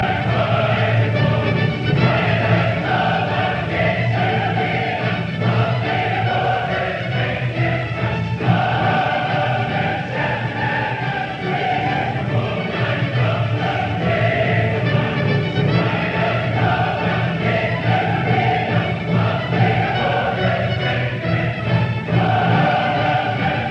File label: Symphony in D minor Choral Allegro assai vivace Alla marcia; Op 125